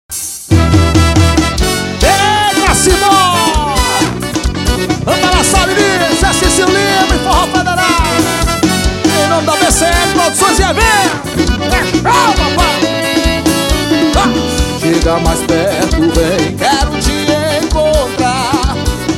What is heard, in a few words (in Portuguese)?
romance